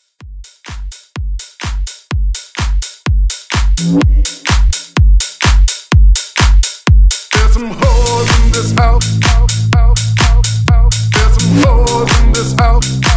Bootleg Rework 126Bpm

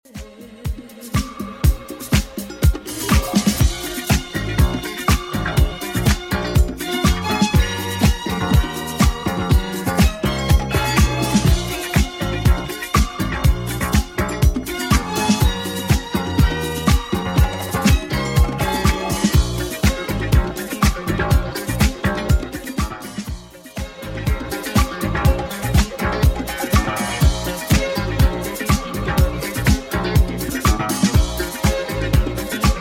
80s Redrum 122 bpm CLEAN

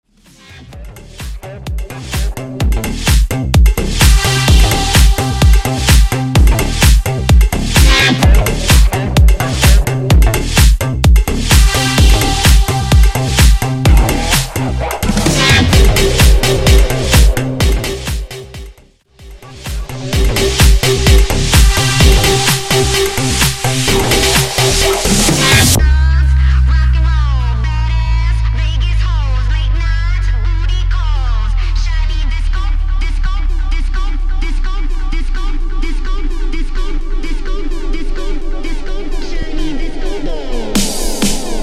Rock n Roll Bootleg Remix; Garry Dugang

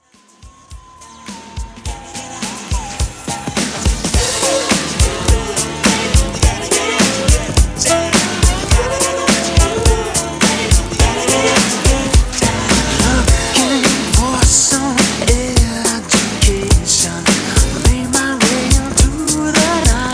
90s Redrum 105Bpm; remixplanet

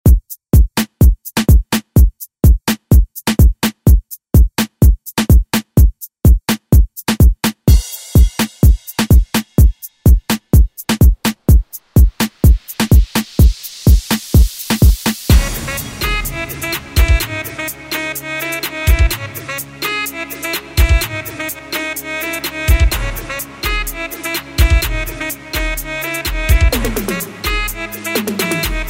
K Pop Redrum; Deejay Hit

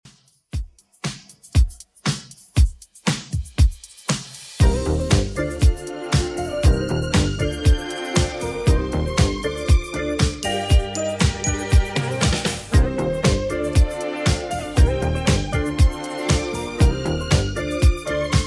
80s Redrum 118bpm

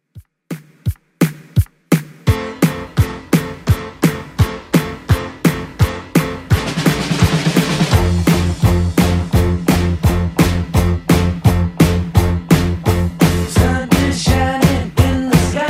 70s Redrum 170bpm

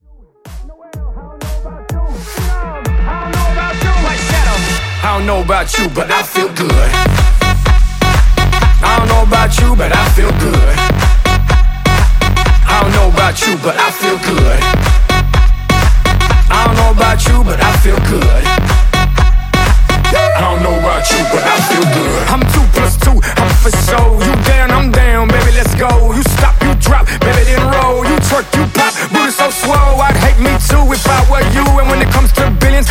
Pop Rework; Remixfreakz

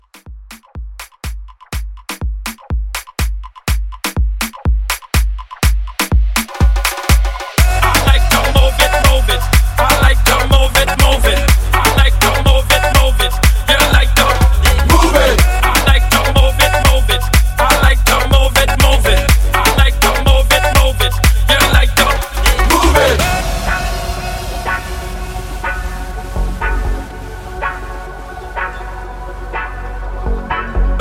Club Mixshow

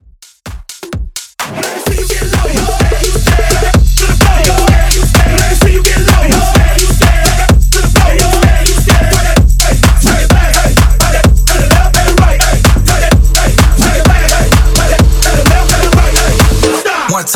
Hype 128 BPM

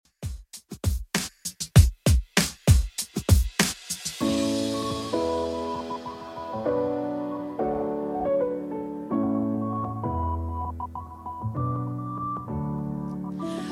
2ks Redrum 100bpm

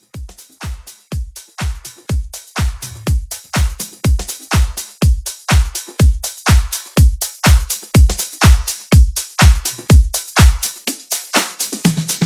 Club Classic House 123

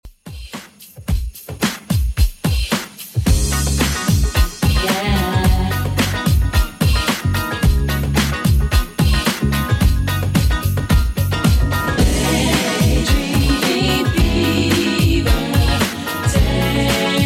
90s Redrum 110bpm

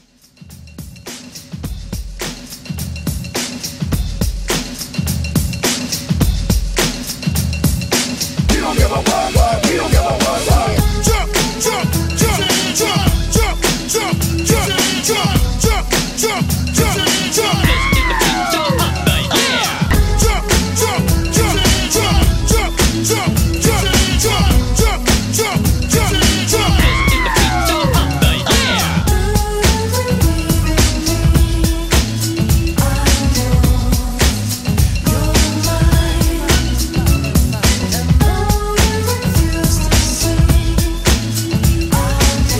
90s Hype Redrum

